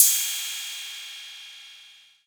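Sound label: cymbal electronic, drum machines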